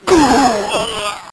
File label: death; quake2; ranger